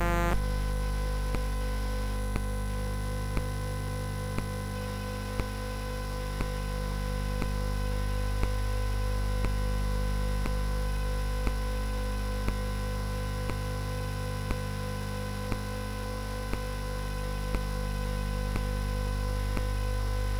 sid CSG8580R5

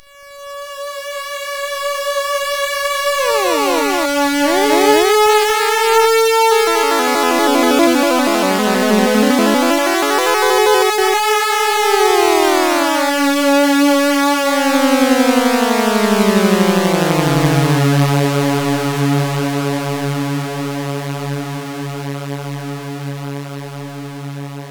Intro sid CSG8580R5

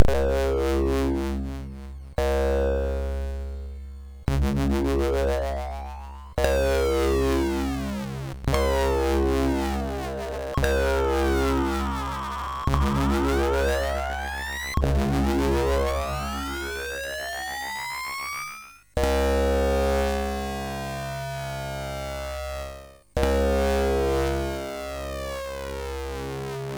Synth Samples Sync, MOS6581R4

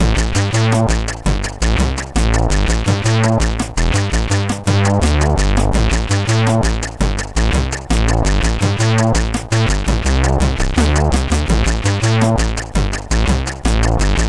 Loader, CSG8580R5; Loader Zak; hvsc

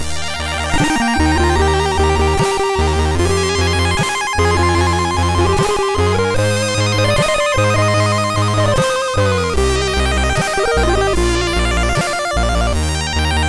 sid MOS 6581R2; Thoerndahl Jesper

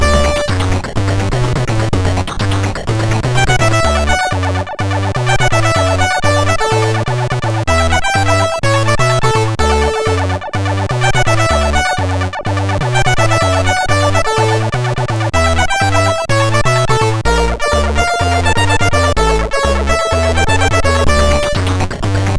Portal Radio Tune, MOS6581R4

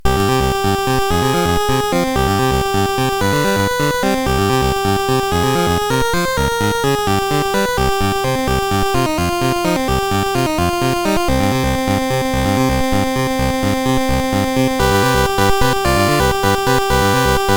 Bed Room, sid CSG8580R5; hvsc

